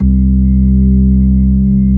B3, Drawbars

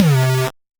Noise, 8-bit, Bitty Noises; musicradar; 8-bit bonanza

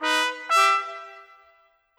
Trumpets, Orchestral; Sonic Foundry (Sony Creative Software); Orchestral VOL-2; Modern 35

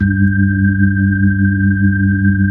B-3 Chorus

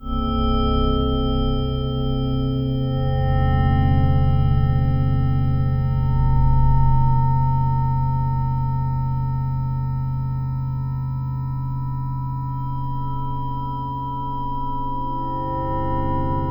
SOFT ENV; Bolder Sounds - Granular; X-FILE

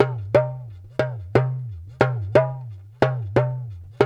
JU JU, African Contemporary; Spectrasonics; Supreme Beats

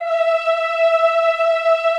Classical Choir, E4; Best Service; Extended Classical Choir

